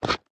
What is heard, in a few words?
resource inventory pickup; factorio 2.0.55